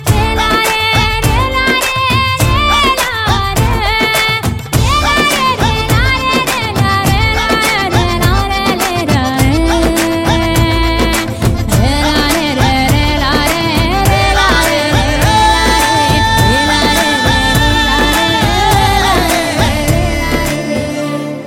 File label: background tone